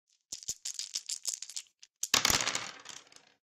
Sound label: dice throw; norden-sounds